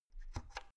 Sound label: oven knob turn hard